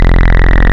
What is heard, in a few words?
Sub Phat OD Sync, wavetables; Animoog